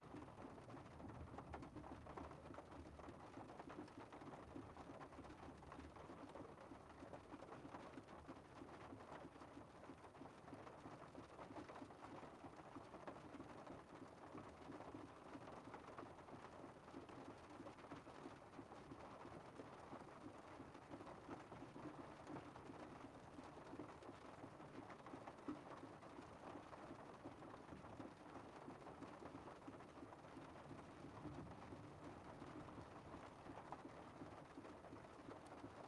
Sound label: ambisonic int vw golf car light rain on roof; sonniss gdc